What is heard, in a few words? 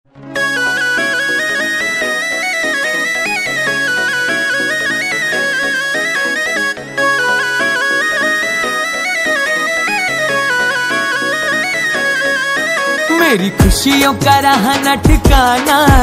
Khatu Shyam Bhajan